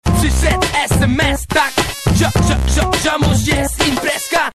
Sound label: Sms Ringtone